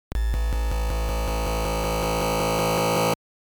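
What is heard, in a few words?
noise, LSDj Wave Synth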